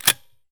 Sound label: sks slider down; Escape from Tarkov